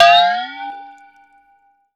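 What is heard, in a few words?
ART SHELL, percussion metallic; Rarefaction; Poke in The Ear With A Sharp Stick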